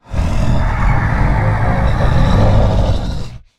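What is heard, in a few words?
Stealth Attack Start; Evolve; Goliath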